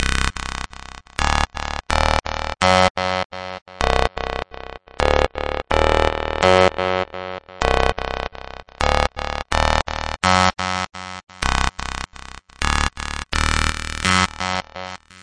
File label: 126 gnarly ominous filter bass punchy; SYNTH AND ELECTRONIC LOOPS